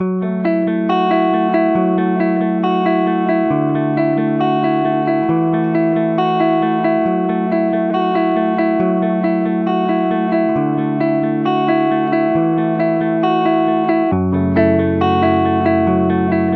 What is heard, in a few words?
136 BPM F# Min, Guitar; Drifting Apart; Cymatics; ZODIAC Beta Pack